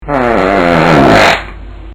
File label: fart